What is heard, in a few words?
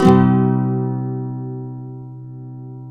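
nylon guitar up major C#, flam chords; Spectrasonics; Hans Zimmer Guitars VOL-2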